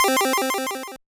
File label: Arp, Stab & Hit; Bitkits